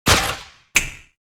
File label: lights on snap; sherlock